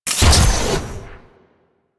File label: Mech Jump Boost Start; Nechro